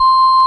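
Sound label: ORG Vox, Continental organ; Continental2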